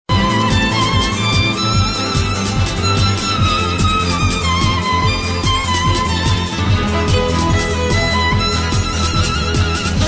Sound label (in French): Violon